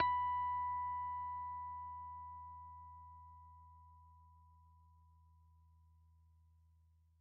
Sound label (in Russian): chistie garmoniki elektrogitar b4